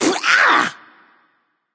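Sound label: clear throat, female; smite; voicepack; hera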